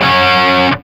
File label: G 4, Soldano rock guitar; Spectrasonics; Hans Zimmer Guitars VOL-1